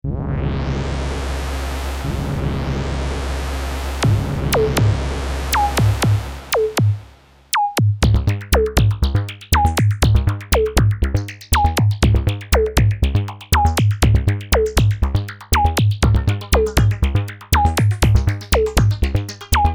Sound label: roland 100m drum; roland system100m